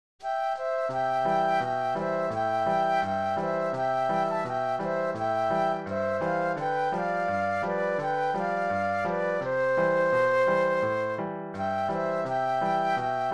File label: flute duet and piano; patriotic